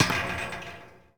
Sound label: Street Ball Metal Back, Street Basketball; SFX Kit